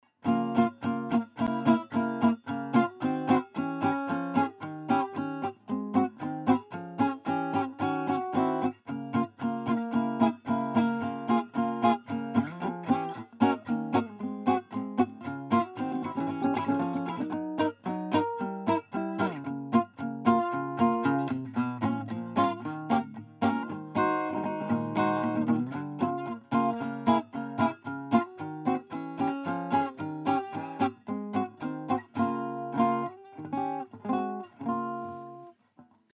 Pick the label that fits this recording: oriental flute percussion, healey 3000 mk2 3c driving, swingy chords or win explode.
swingy chords